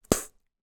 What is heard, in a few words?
food candy bubblegum blow bubble pop